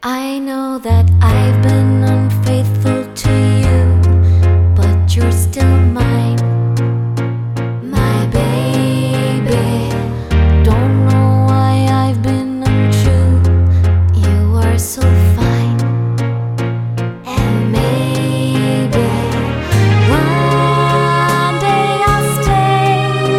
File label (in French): baby musique film, chant